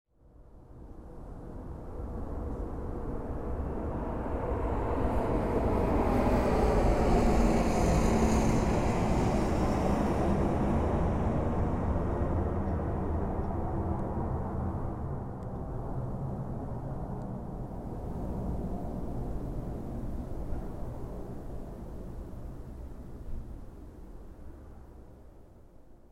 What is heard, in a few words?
Jet